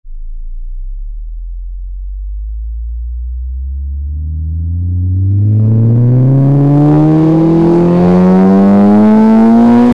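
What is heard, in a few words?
electric vehicle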